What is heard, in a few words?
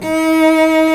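STRINGS SOLO, Lrg Ensemble; Roland L-CD702; Combos 1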